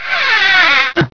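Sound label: door close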